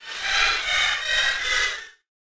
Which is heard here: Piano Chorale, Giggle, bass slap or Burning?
Giggle